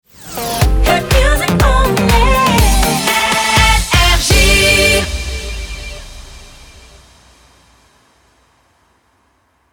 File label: Jingle Shotgun; NRJ